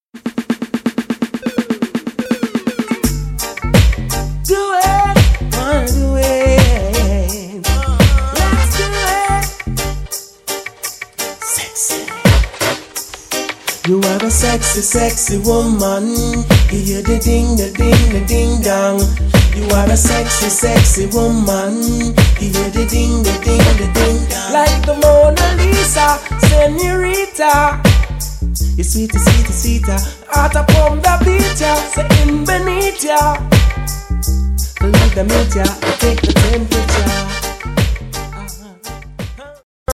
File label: bass